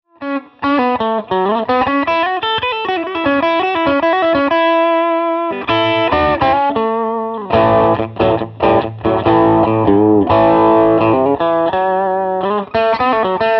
Blues

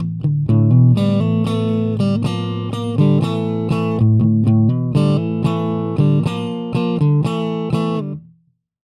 mbox pro rodent

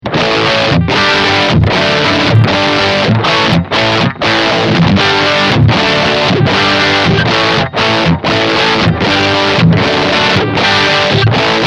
More Overdrive

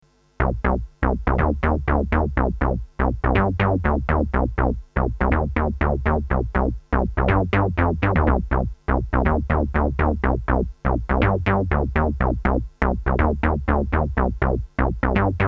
Boss CE-300

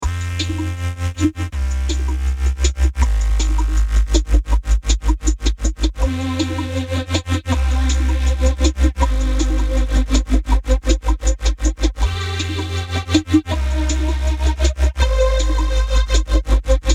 Pad + Carrier; SVC-350; 2x Carrier

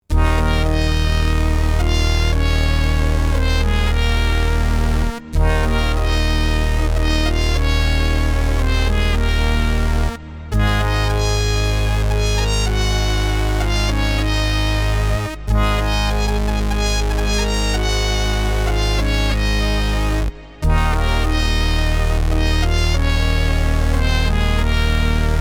PPG Wave Computer 360 Brassy

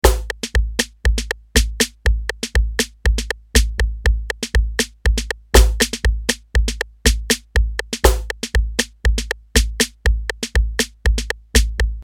Kawai XD-5 Groove

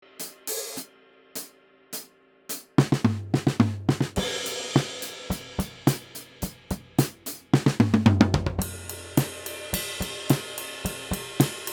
Oktava MK012 Drums OH 1 Meter

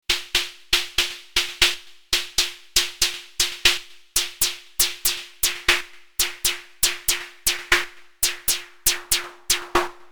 Dreadbox Drips Snare Noise VCO BP

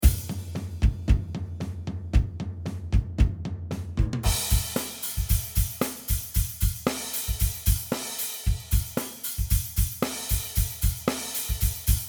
drums unprocessed

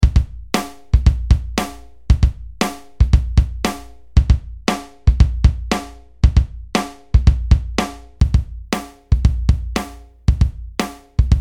Drum Loop

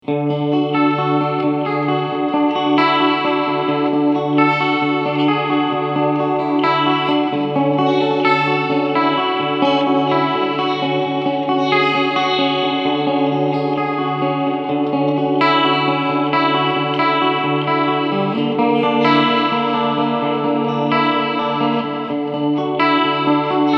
Harley Benton JamBox Clean Delay Chorus

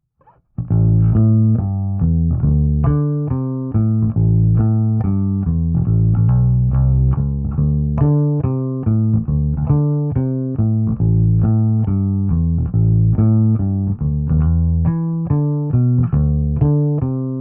BLUES Bass AMPS; Mark Tone

